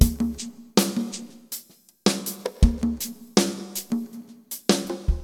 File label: drum; FUNKIN&GROOVIN'